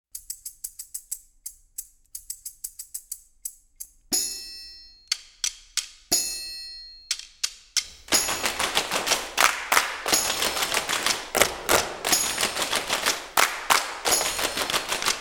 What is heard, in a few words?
Kid Percussion